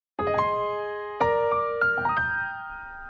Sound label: Bumper Tune; Sekirei